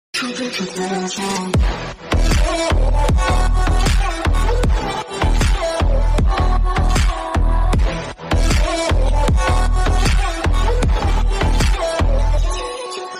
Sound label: bgm ringtone; english ringtones